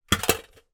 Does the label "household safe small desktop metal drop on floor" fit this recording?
Yes